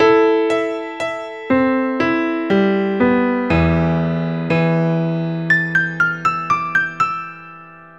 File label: piano, sad